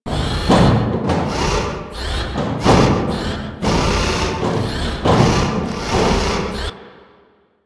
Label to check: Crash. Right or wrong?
Wrong